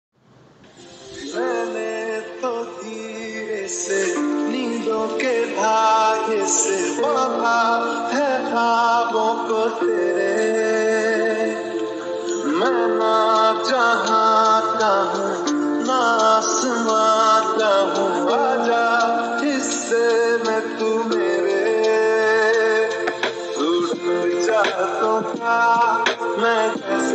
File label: lofi song